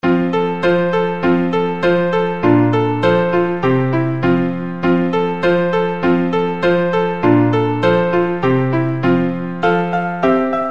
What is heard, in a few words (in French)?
debuts piano